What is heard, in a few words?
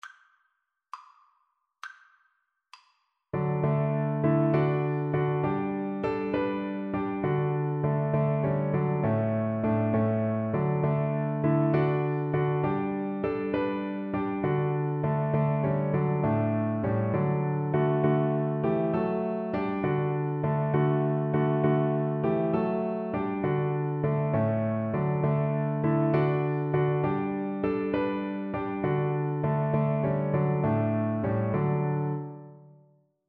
sweet hour of prayer, Tuba